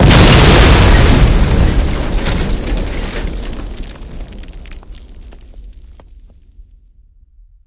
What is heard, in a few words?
heavy debris, explosions; hl2; impact